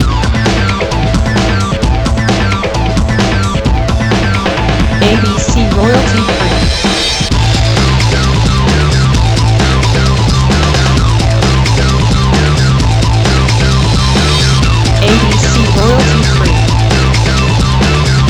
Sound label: action breakbeat lo-fi